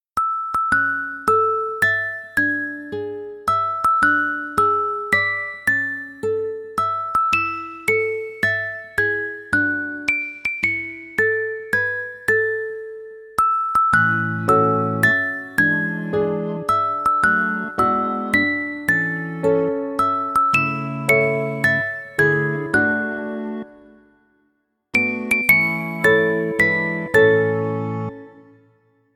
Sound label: Happy Birthday To You Lullaby